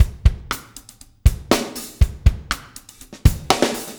DUGA, Backbeat

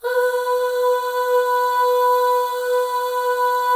Voices, FEM AHS; Spectrasonics; FEM.2 AHS

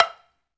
African Perc

